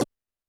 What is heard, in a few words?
percussion, Roland TD-20